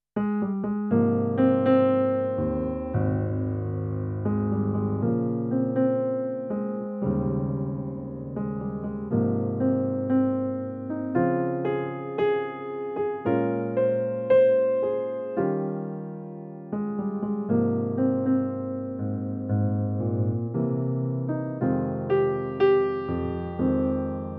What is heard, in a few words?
piano solo